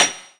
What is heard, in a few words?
Small Ball; HeavenStudioPlus; working Dough